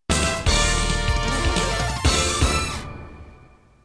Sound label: music VS